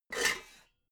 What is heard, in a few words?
pots clank roomy